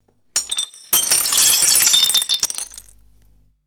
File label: ceramic crockery shattering and scattering; destruction